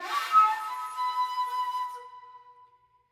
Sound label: Flute Shakushaki; unruly heroes